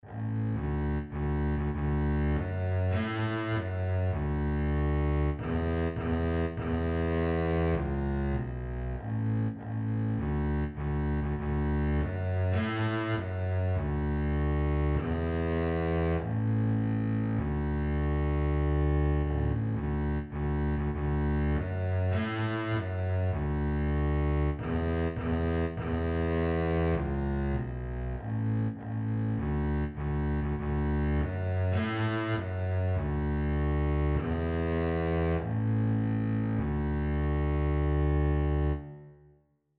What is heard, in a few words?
wheels on the bus double bass